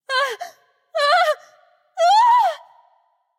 fear light; smite; voicepack; hel

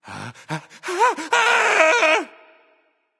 fear; smite

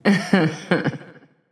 hehheh; smite; pele

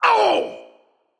ouch; smite; voicepack; set

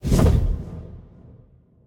wing; smite; npc; grkconqs8 titan chaos; s8chaostitan

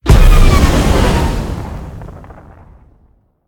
Tornado; Smite; Susano

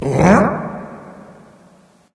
nv confused; Smite; awilix

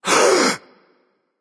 nv breath; smite; voicepack; xbalanque

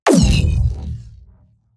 throw; smite; atlas

